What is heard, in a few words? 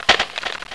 chute, weapons